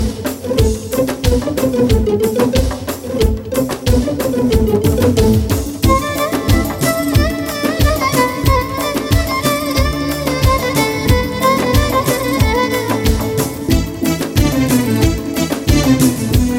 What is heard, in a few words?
Violin